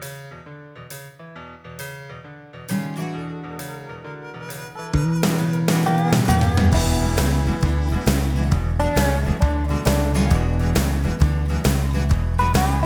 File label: bgm; WildAttack